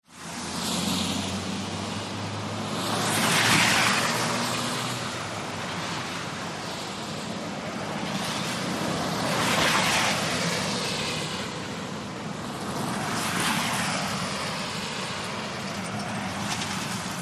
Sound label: Traffic Wet Street